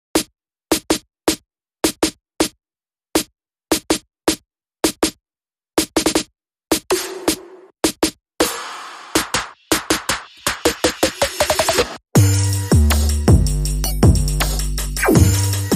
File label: Drums and Bass